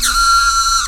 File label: Croak